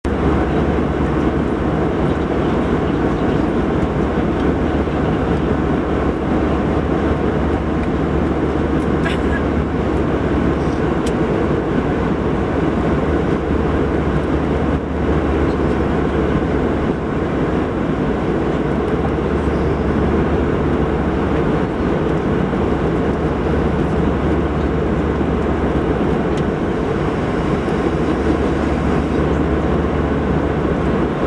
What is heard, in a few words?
Airplane At 30 000 Feet